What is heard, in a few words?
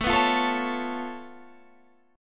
C sharp Augmented 7th A, chord